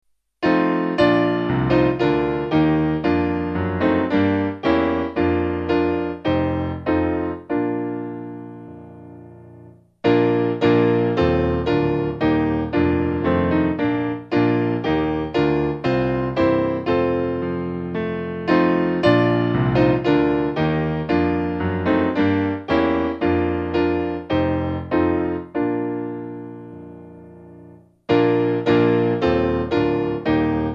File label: St Saviour Piano; Hark The Glad Sound